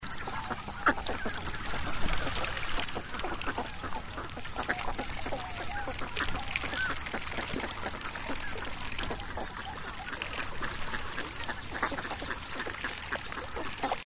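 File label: northern pintail call; onagagamo nakigoe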